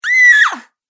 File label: Female Scream Terror; Screaming Sound Effects